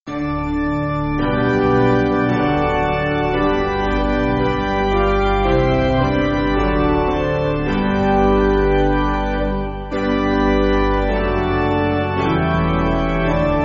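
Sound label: Piano, church backing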